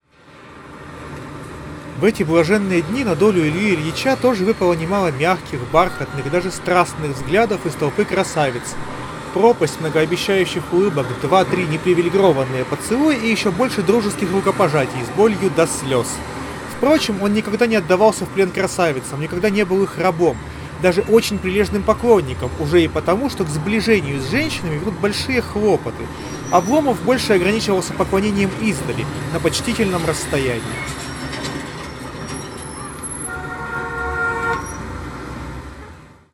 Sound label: Recorder noise; huawei freebuds pro 4